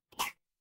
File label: cartoon pop